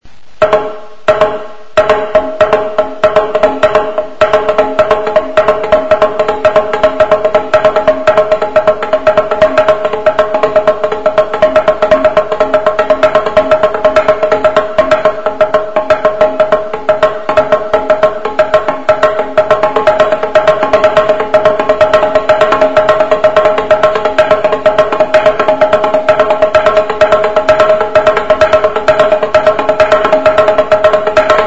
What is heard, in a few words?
TXALA, Toque clasico; Txalaparta